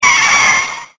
car skidding

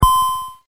TIMER

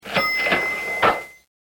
cash register

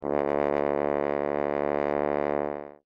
French Horn ff D2

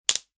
wood cracking

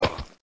fall hard

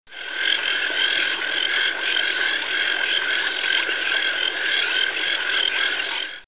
frogs

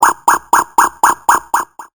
Burgular alarm

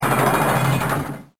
machine

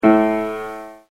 piano A1

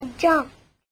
jumped

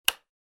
light switch

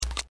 insert shell

